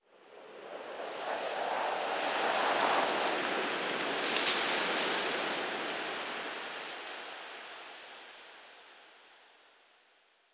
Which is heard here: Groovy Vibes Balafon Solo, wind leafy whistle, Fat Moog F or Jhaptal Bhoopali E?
wind leafy whistle